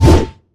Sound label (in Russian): zamah ogromnogo mecha gladiatora